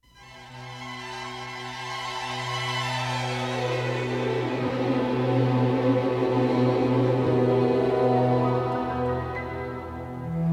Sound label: Strings Orchestra